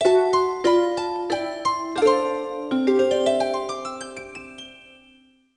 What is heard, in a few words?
Jingle Best; tamagotchi connection corner shop ds